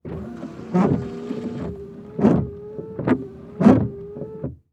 Truck Ford Ranger Squirt Fluid And Run Windshield Wipers; transportation